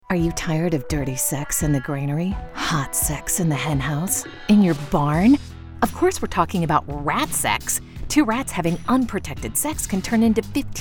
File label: COUNTRY